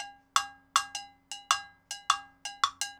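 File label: timbale cow, latin, 80bpm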